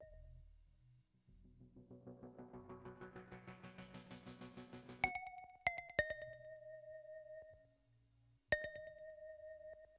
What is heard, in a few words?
Synth Chorus